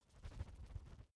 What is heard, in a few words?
feather flapping short; kreaturen